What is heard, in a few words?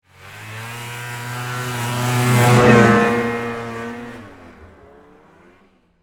Snowmobile By, Snowmobile, Pass, By, Close, Fast, Speed, Yamaha, Exciter, 440; Sports; Miscellaneous Sport Sound Effects; BlastWave